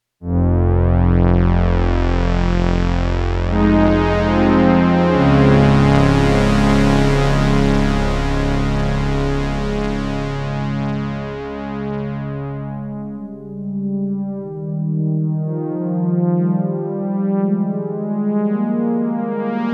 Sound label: k3 analog brass